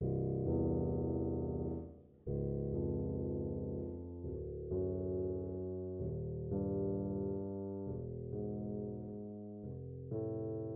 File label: 10ths Test for 88 note; CHas Starting on lowest C